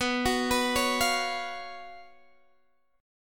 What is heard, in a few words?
F sharp Major 7th Suspended 4th, B, chord